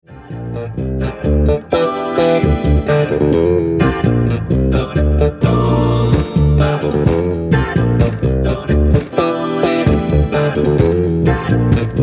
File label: Mechanical Choir; Medical Records